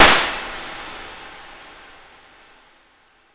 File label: Matchstick